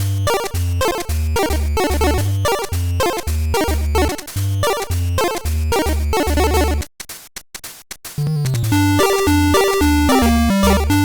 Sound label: Chiptune Cover